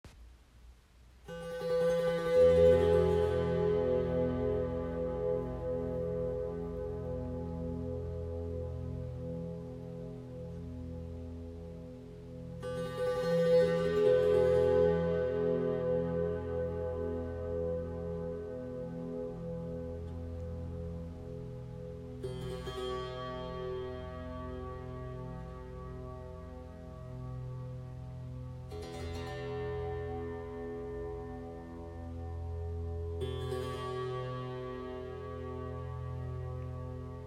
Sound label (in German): Klangprobe Monochord